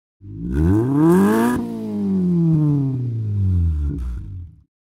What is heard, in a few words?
car reving